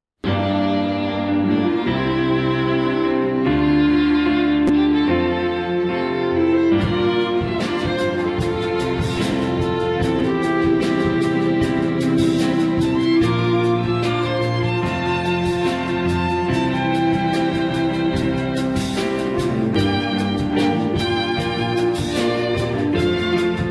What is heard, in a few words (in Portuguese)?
Quarteto teclado e percussao